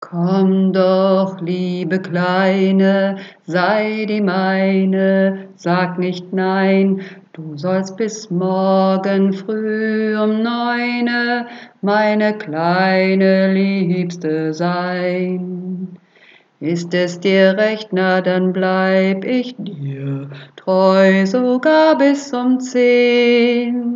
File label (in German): Tiefstimme